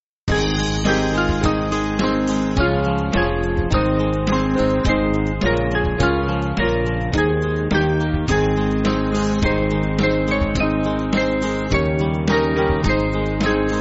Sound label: Music Backing Files for Churches; Speed Thy Servants; Dismissal